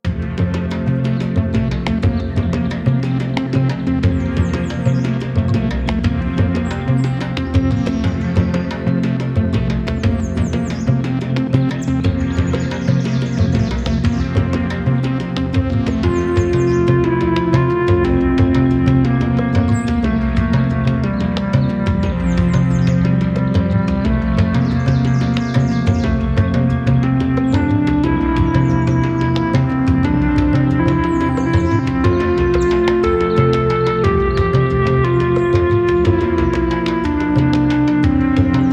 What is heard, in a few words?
Overworld Jungle Loop With amb; Rad Rodgers Radical Edition